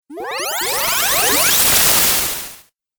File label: Teleport Warp Video Game